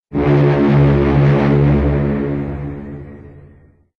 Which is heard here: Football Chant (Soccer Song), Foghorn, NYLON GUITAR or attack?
Foghorn